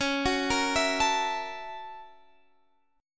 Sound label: Gb 7th Sharp 9th Db, guitar